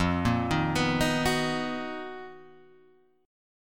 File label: B Major 7th F, chord; Bmaj7/F arpeggiated; JGuitar